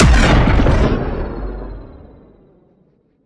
Sciweap Shot Large Gun Cannon Blast Parry

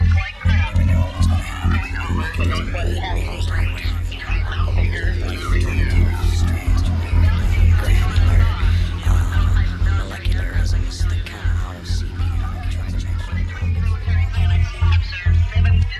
loop; brainpipe

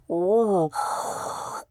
antic

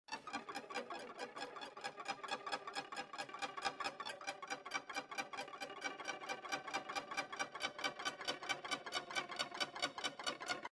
tension; Among the Sleep

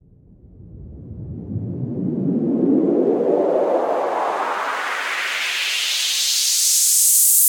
Riser

